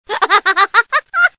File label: laugh